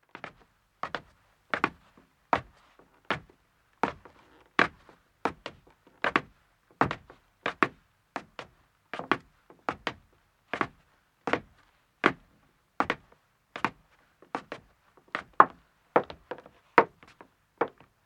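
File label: Footstep wood ascending, 2 men start and stop; Part of Footsteps (Sound Effects:Human); BBC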